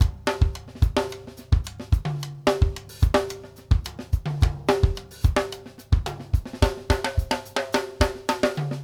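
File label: basic, latin, drums; Sampleheads; New York City Drumworks VOL-1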